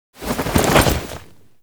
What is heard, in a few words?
APC Jump Off Back Right; Enemies; Lasrian; Warframe